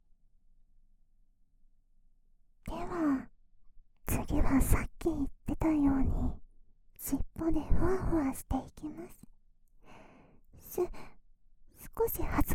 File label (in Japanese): リスさんのもふもふしっぽ耳かき(右), ASMR; 【耳かき】もふもふリスさんのおんがえし